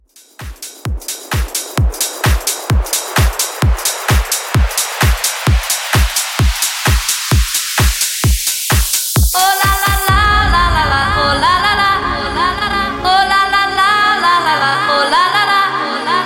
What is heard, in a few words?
Extended Mix 130 Bpm